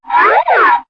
cartoon spin whistle; Cartoon FX

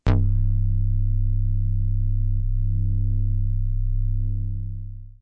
hard low, Basses

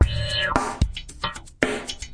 wavesqueal, 113 bpm, drum loops and breaks

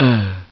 "Uuhh"

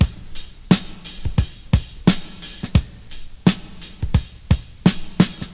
086 bpm loose groove, DRUM LOOPS and BREAKS

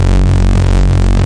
fat saw, Synth

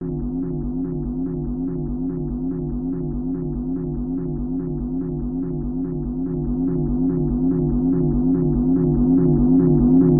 144 muted filter techno

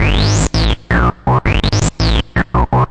165 nasty climber, bass loops

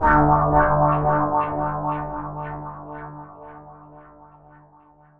sh101 tone, Synth

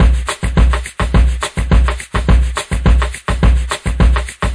round bossa, DRUM LOOPS and BREAKS, 210 bpm

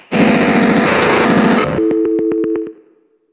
short not sweet, Guitar Noizes

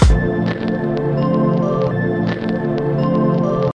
130 vinyl bells and pad; SAMPLED MUSIC LOOPS

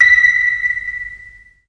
sonar ping; Sound FX Real Life

